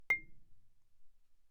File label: Marimba rubber mf C7; Percussion